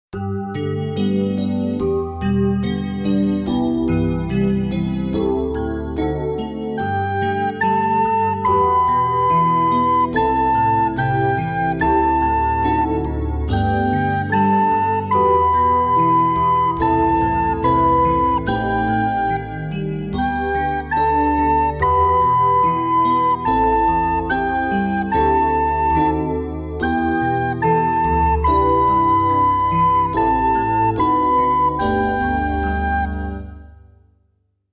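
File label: Fast with melody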